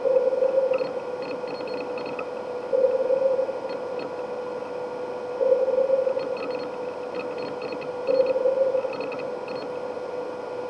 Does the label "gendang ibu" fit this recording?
No